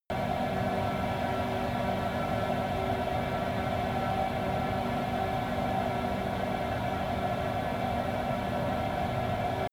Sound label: military jet flanker-c turbine idle close